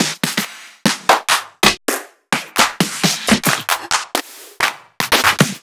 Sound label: Claps